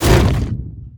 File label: Leap land; Evolve; Goliath